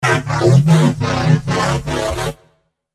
Neuro Bass FX; BHK Samples

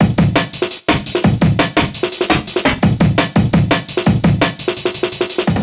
Break 170

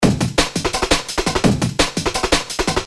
Break 170

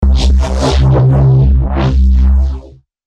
Neuro Bass FX; BHK Samples

